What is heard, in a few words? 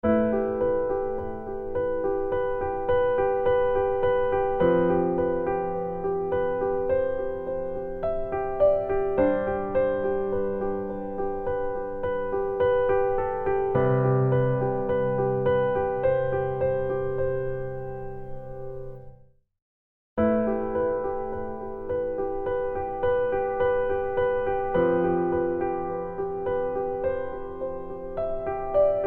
PIANO Mid Range Space